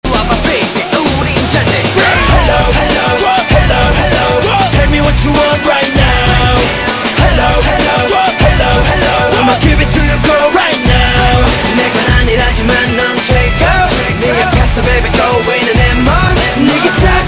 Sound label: korean ringtone